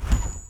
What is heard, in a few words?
MOVE; C64 Dreams; Multi-Sound Default